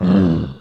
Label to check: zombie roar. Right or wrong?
Right